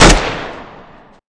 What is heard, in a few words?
ump45, weapons